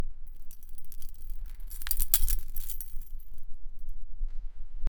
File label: clinking of chains snappi